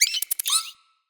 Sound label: Misc Squeaks, Animals, Vocals; Floppet